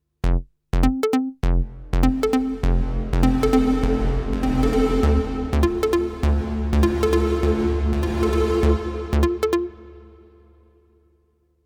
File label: ENSONIQ Lush Plate